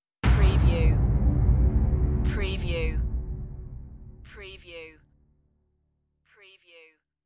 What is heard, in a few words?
Trailer Production Elements - Hit - Impact, Deep String, Afterglow